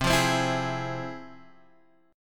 F Minor Major 7th, chord

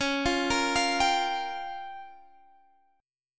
G Minor 7th Flat 5th C sharp

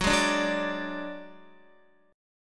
Gb Suspended 2nd Sharp 5th, Guitar; Gbsus2#5 strummed; x,9,6,7,6,9

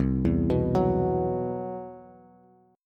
C Major Sharp 11th C down Bass Bass Standard; arpeggiated; CM#11 chord

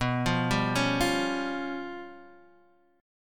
C sharp 7th Flat 5th B

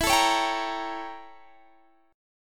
A 7th Flat 9th E

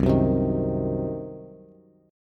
C Diminished C, Bass; strummed; JGuitar

